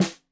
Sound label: Snare 65 Reg Stereo, Deeply Sampled Drums